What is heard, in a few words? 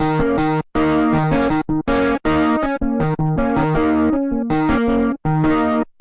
Distort; Blockland